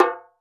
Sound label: Dholla Rim; Roland BK-5; Percussion; Dholak